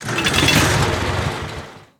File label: Spark Drill Play